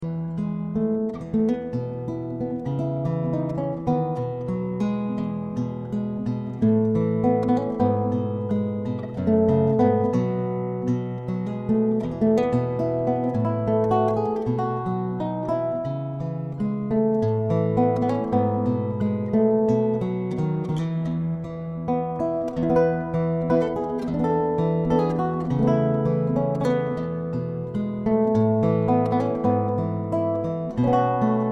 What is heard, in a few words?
Lullaby